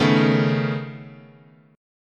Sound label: C sharp 7th Suspended 2nd Sharp 5th, guitar chord